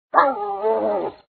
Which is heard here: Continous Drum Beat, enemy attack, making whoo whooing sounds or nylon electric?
enemy attack